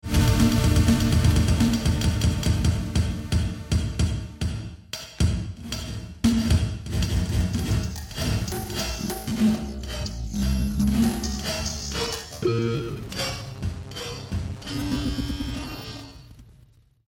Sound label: karaoke version